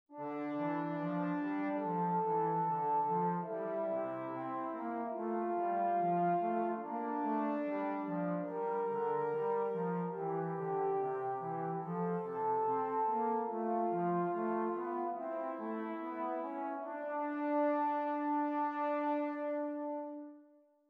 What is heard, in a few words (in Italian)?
Esercizi di contrappunto a due voci; Dritte Gattung; Correzioni